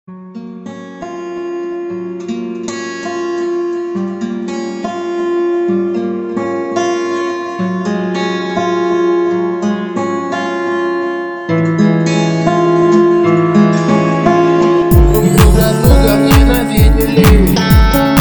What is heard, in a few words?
Gitar Remix